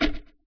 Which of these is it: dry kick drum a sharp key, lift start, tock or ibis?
tock